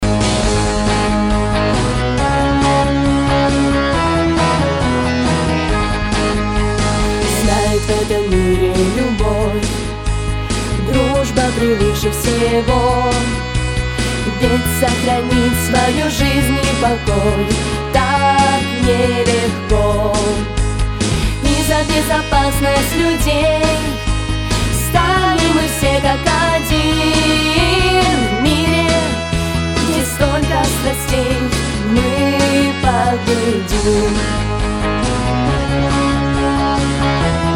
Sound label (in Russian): Гимн; Луганского отделения Школа безопасности